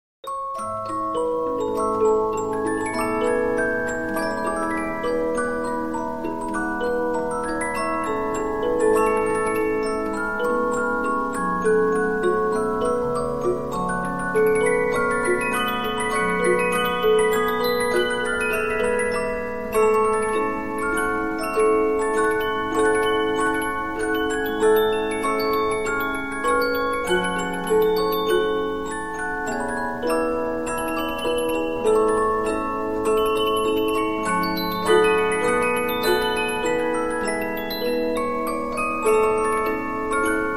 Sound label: Polyphon, 15inch disc musicbox